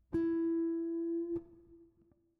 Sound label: 1/4 step bend, guitar; guitar string bending